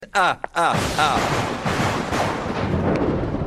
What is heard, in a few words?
ahahah